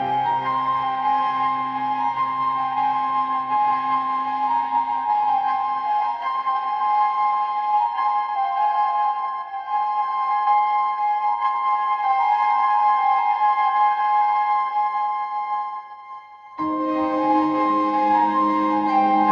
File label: ringtone anime